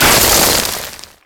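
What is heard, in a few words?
destroy bag beans; Explosions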